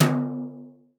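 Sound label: brush medium; Northstar - New Gold VOL-2; 50S BRUSH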